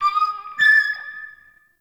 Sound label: Forest Bird Mid; Ambience